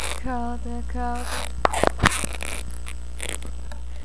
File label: crowd